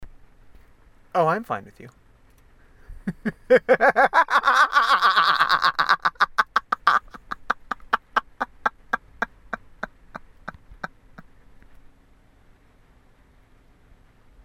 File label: good laugh